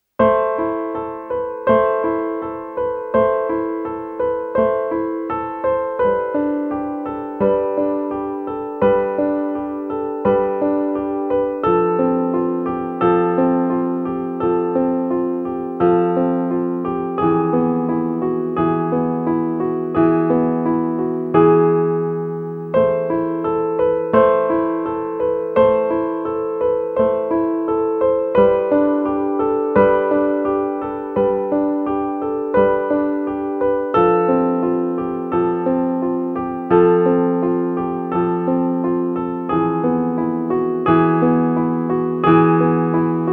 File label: solo piano